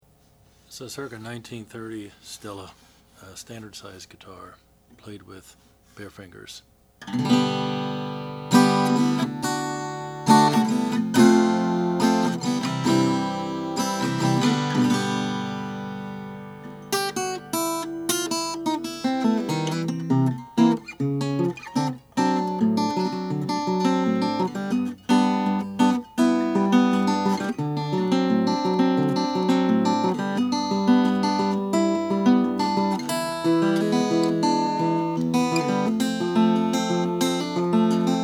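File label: oscar schmidt stella h912 decalcomania, Guitars; 1930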